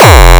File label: filtered kick F